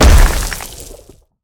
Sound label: punchground; smite; god geb skin7